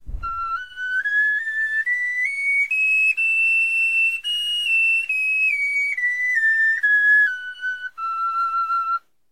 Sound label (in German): tonleiter